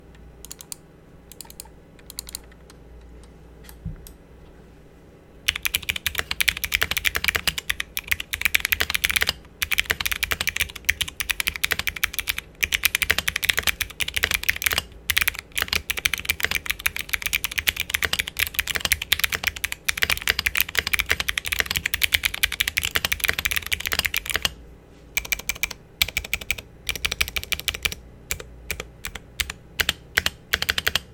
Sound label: Typing; Clickiez Typing Test